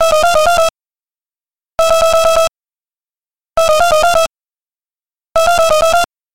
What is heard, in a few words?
Leather Polish